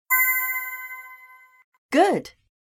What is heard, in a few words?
Correct